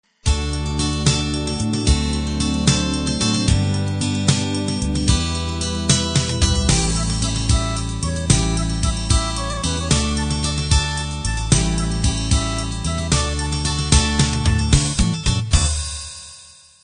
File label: celtic ballad